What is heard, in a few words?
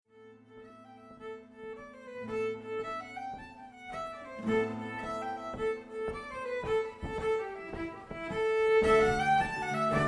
jig